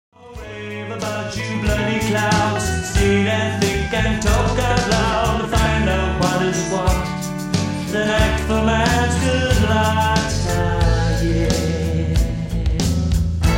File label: Brand New Chorus